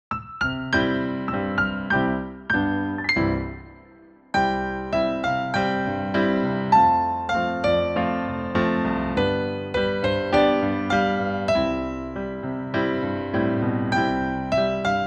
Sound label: duet melody